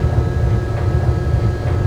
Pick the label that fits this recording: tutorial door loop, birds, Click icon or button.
tutorial door loop